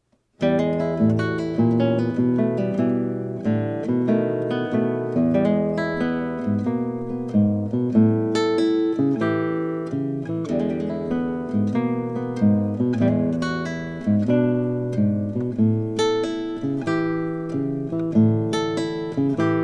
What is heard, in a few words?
Acoustic Guitar; Cocktail and Dinner Music